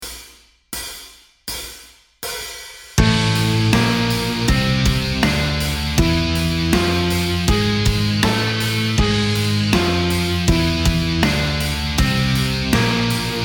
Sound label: Exotic Modes A Neapolitan Minor Relative C Major; metal music theory